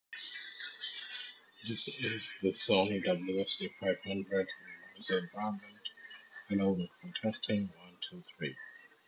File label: Noisy; Sony WF C500